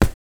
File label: hit; roguish